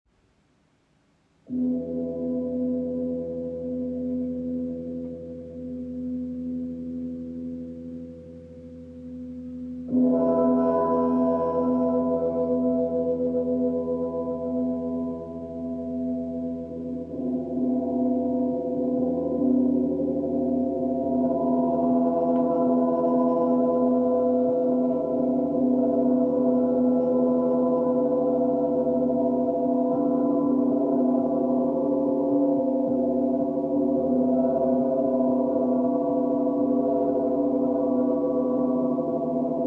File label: Gong; zvukove lazne